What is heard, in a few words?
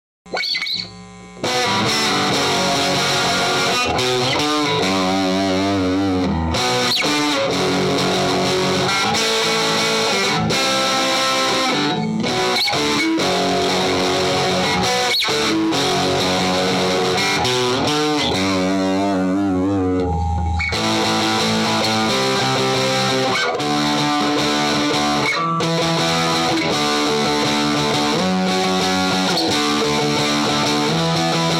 guitarrra